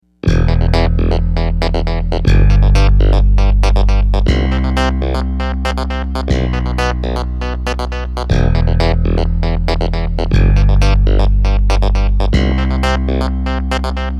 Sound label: Multiple Compound Jazz Fusion